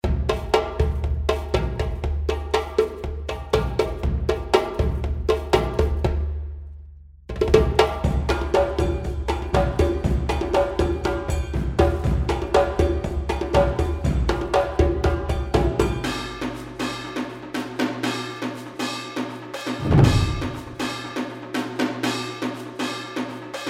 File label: World Inspire Set